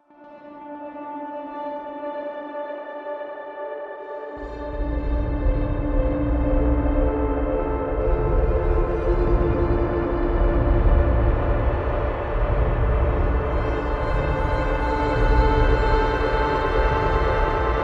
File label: DRONE; escape academy; What Lies Below